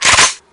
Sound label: mk18 boltpull; tf2classic